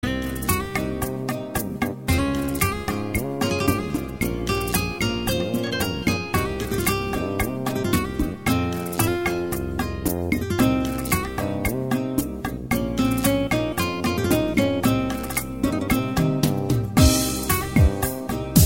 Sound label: Rhumba